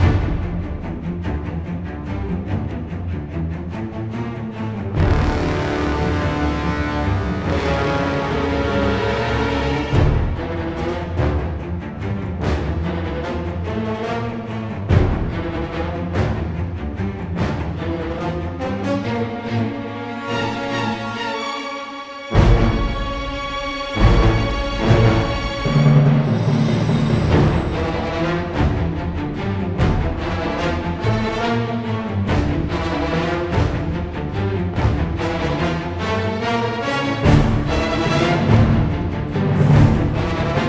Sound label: Fight Theme Action Fast Start; ryse son of rome 2014